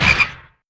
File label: frog; Politburo